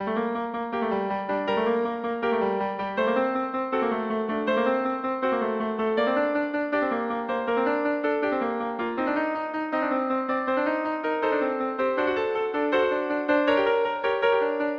confutatis, clarinet trio

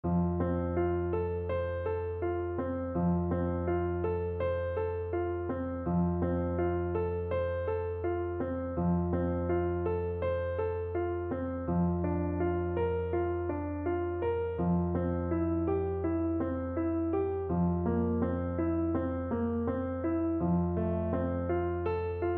duermete mi nino, French Horn

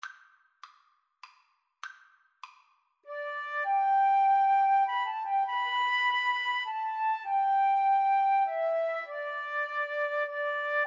amazing grace, Guitar-Flute Duet

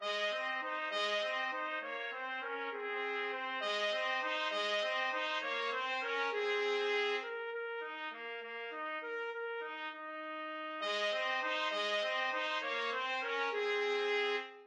moaner TPT duet, trumpet duet

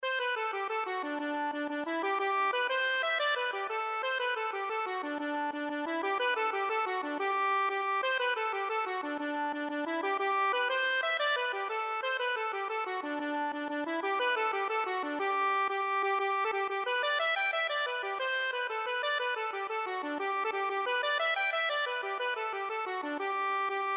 accordion

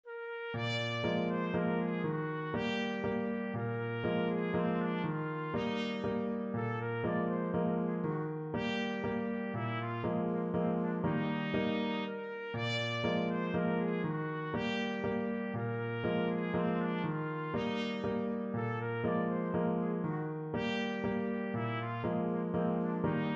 waltzes trumpet

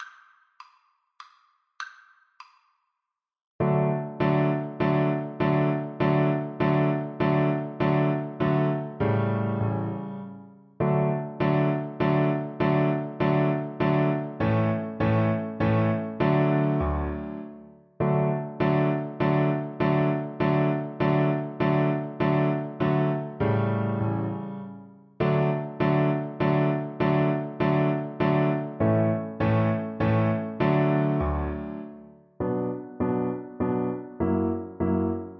cello

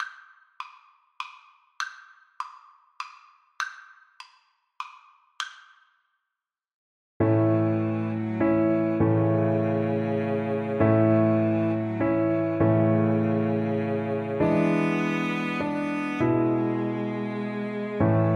cello

